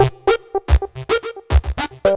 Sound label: philter (acid); Sonic Foundry (Sony Creative Software); Ugly Remnants VOL-2